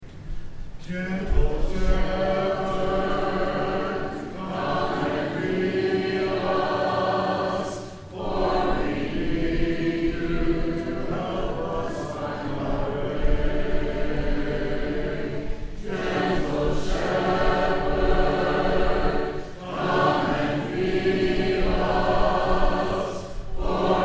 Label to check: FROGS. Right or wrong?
Wrong